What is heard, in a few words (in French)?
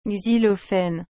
xylophene